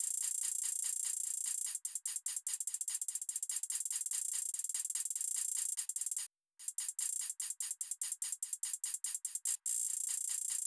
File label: Hi Perc Loop; KRNE